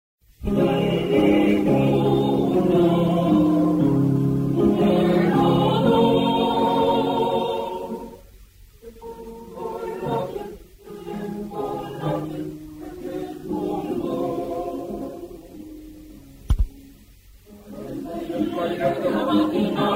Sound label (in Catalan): Cor mixt secció femenina, castelló